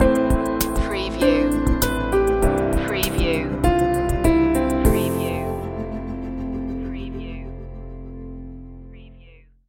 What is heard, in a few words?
changing light stinger